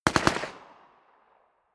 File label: smg uzi 45 distant burst